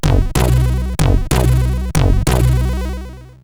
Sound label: Polonaese, sid CSG8580R5